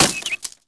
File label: egg, Impact